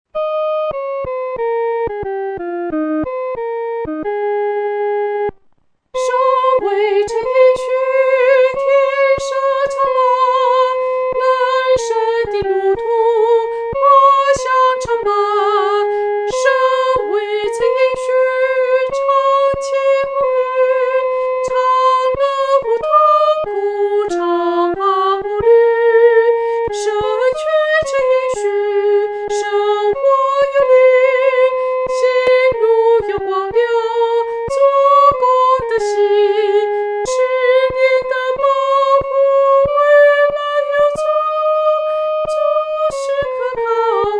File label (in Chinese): 独唱（第一声）